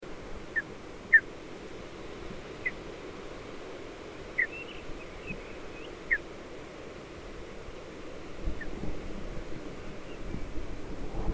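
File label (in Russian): malyy podorlik golos, lesser spotted eagle voice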